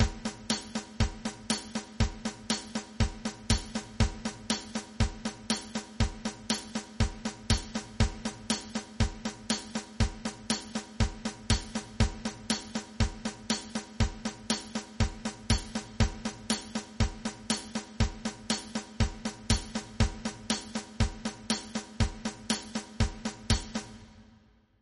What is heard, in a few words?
jazz, rhythm